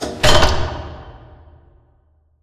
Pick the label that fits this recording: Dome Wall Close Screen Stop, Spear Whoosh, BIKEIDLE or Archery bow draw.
Dome Wall Close Screen Stop